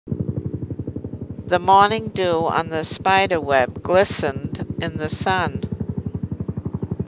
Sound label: helicopter noisy